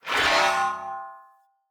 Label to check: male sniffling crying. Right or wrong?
Wrong